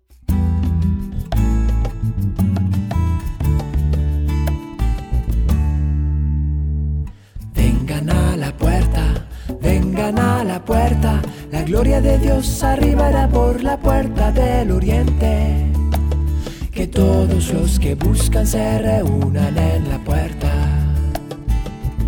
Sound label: Song; ES